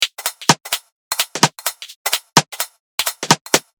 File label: Beat 128, uk garage